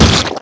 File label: splatter